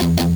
Distorted Guitar Shred Double; Genesis

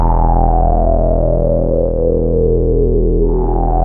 JUPITER 8 E2, Rare Synthesizer; Hollywood Edge - Giorgio Moroder Rare Synthesizer Collection

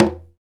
PRC CONGA, Roland

